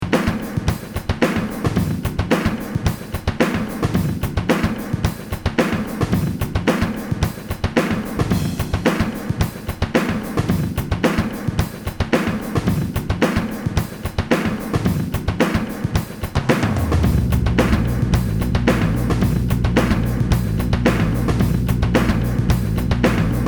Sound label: Only unproc